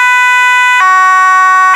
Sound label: hilo, danish siren; vcmod; els